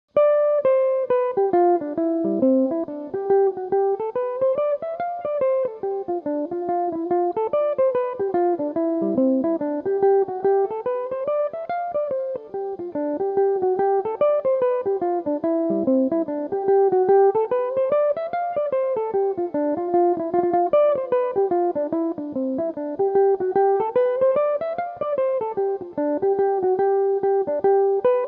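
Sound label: G mixolydian, irish mandolin, tunes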